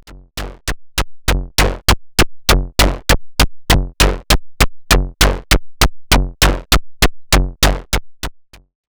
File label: Groove; Body Synths Metal Fetishist